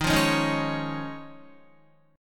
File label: Eb 7th Suspended 2nd Suspended 4th Eb, Guitar; strummed